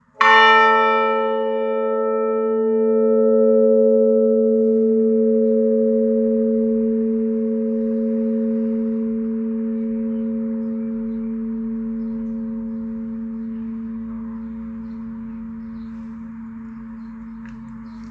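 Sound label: old seven, bells; lower beeding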